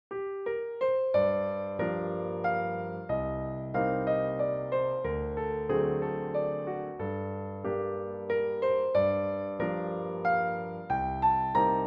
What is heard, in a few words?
Adonis ramosa in one corner, bgm